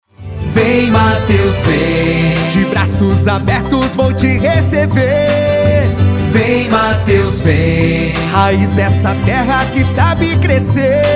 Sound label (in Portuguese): Jingle DEP ESTADUAL; Alagoas